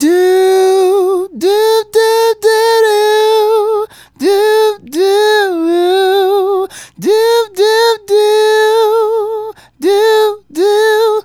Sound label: Male Solo, Vocal